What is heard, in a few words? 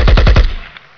MECHWEA; 枪炮声音素材